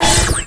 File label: Mine Layer Shields